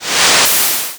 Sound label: Cry